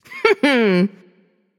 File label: hehheh; smite; pele